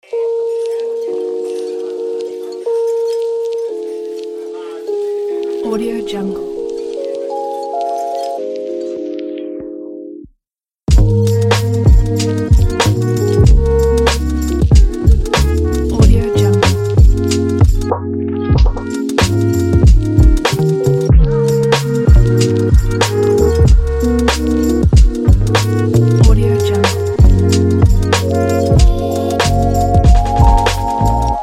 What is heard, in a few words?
lofi chill; vistula waves